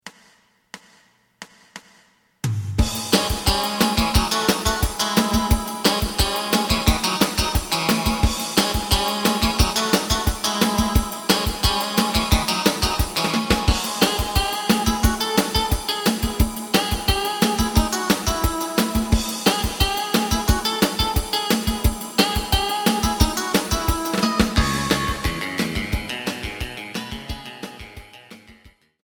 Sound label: bass